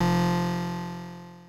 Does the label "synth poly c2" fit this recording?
Yes